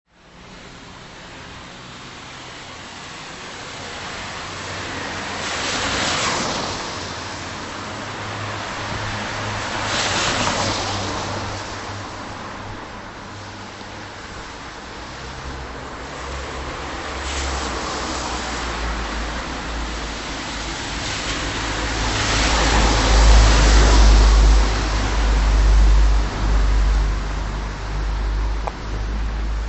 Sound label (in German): Autos im Regen